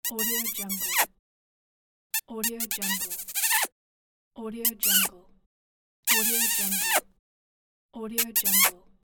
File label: kiss